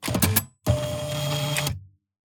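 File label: Movement Paper Release, Electric Typewriters; SoundBits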